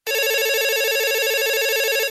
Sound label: tring